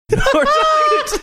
cute laugh

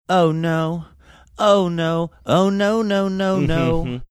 singing oh no oh no oh no no no no

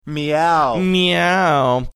meow meow

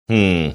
hmm

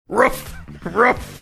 barking

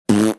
blowing raspberry

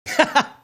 short chuckle

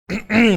clearing his throat

